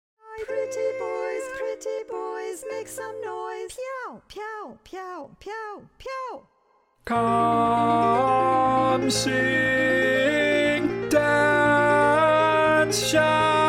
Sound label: Audition Tenor Long Notes; Auditions